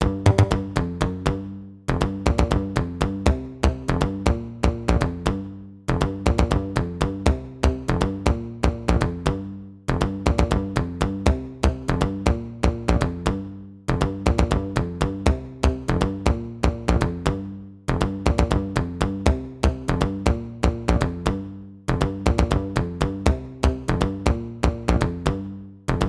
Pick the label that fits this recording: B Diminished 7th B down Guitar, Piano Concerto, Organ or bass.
bass